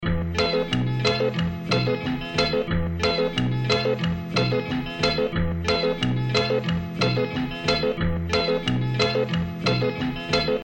Music Intro; Snoopy

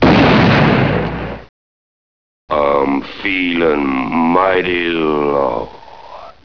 Boom; Loony Tunes

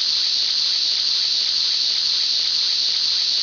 fuse

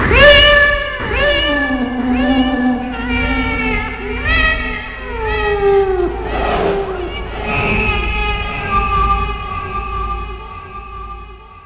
catfight

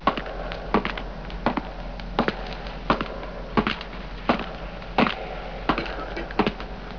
footsteps; Halloween

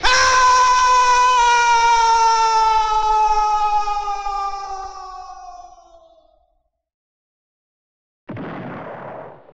fall thud

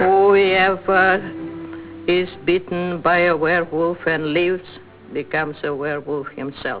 bite; Halloween

